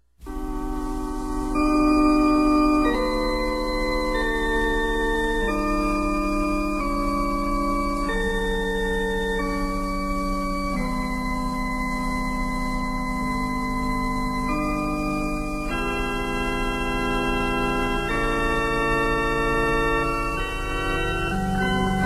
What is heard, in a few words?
Loves Whistle; Free Sound Orchestra